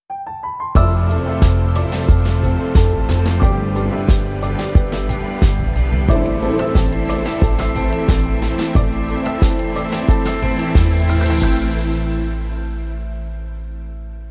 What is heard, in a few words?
Elegant Ambience, Long Sting